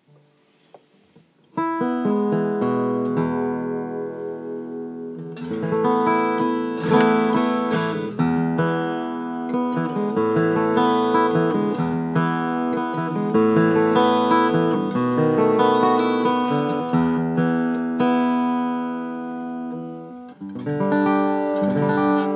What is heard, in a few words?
D-18A, mahogany vs rosewood